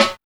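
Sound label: house snare drum; E-Lab; X Static Goldmine 1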